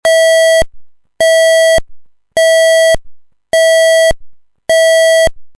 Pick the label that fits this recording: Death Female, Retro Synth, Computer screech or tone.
tone